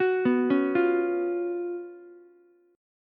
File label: Gb Major 7th Suspended 4th Gb Ukulele; GbM7sus4; arpeggiated; JGuitar